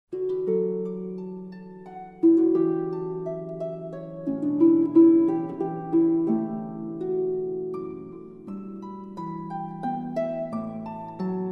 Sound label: Psalm 23 and Just as I am, hymns op de harp